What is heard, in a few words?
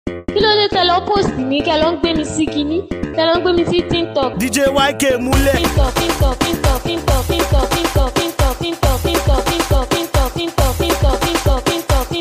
Beat